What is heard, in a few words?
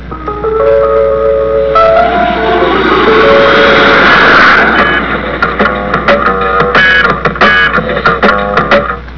groove